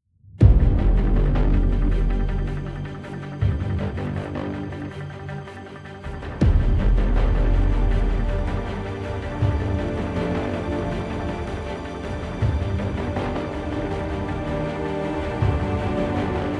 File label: Trailer Strings; Musical Sampling